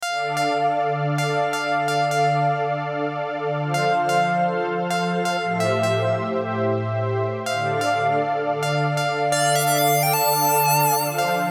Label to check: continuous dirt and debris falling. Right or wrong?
Wrong